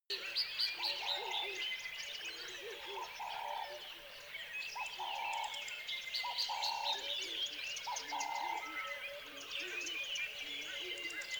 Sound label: tropical river lively wildlife